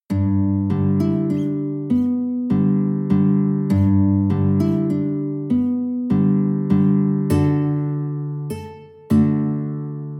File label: silent night, guitar